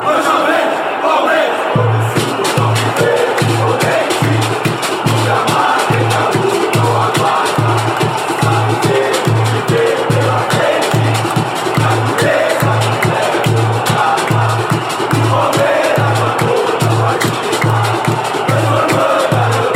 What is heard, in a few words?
hino cantado pela fan chants; mancha verde